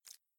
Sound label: slick loop